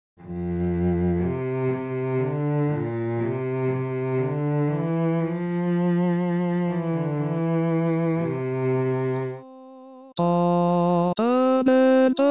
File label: tanta beltad basse